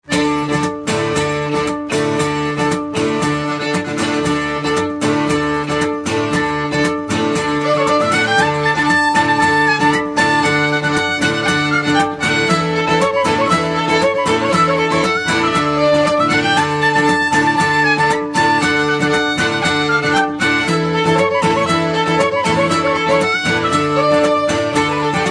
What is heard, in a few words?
Polka